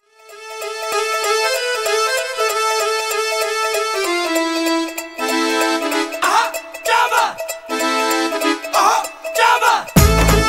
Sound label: Kannada Bhangra Dance